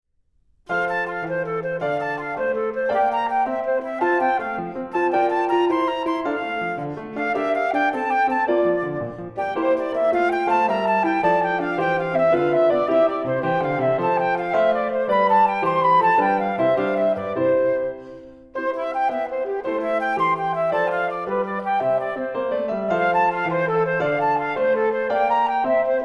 performance, Flute; Handel; ABRSM

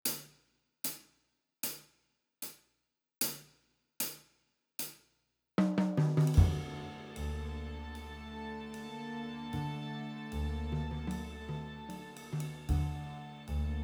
Slow Blues Backing

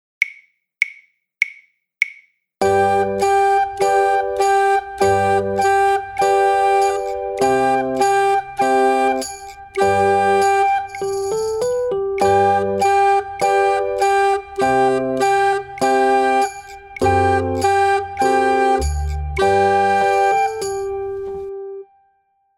G Tune Full Performance

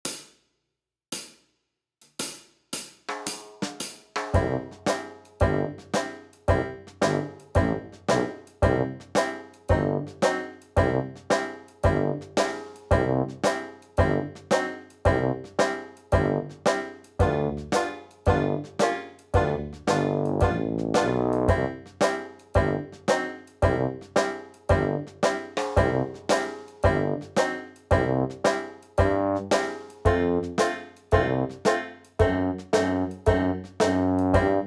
Dixieland backing slow

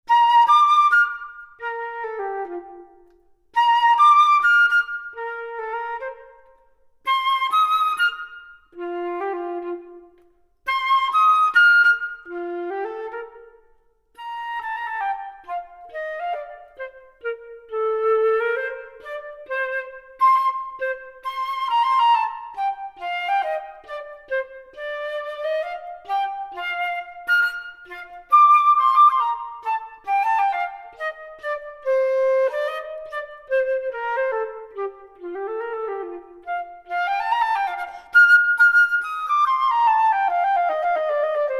performance, Flute; Hart; ABRSM

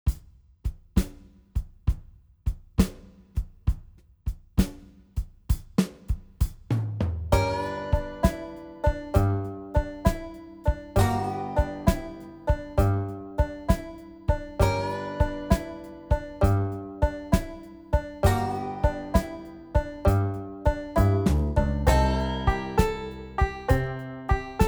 Country Blues Backing Slow

